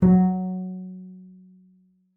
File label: F#3, bass pizz; Xsample VOL-5